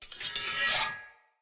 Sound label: Cleaver Heavy Whoosh Wind Up Accent, Swords; Weapons; Player; Bromius Cleaver